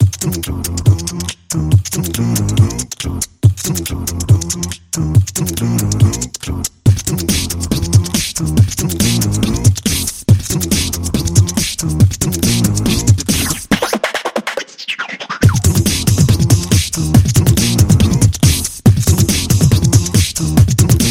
Beatboxing

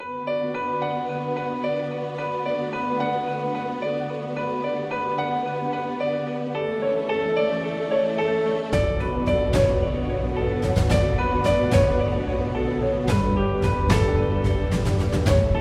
Epic Orchestral

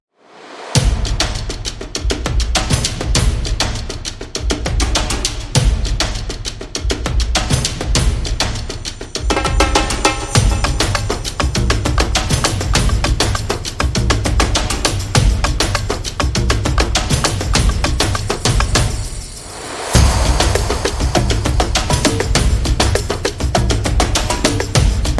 Amazon Tribal Drums

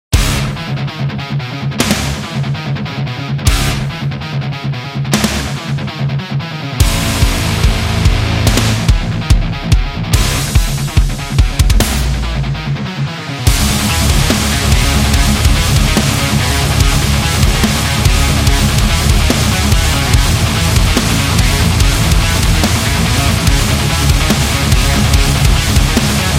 Top Gun

